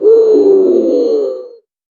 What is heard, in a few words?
death sound for casual pl